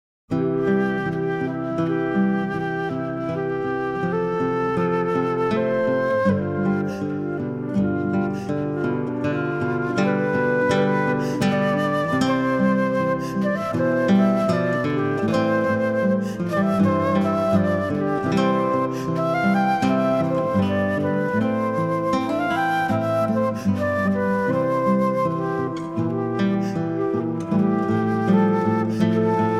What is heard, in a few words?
Sheep Flute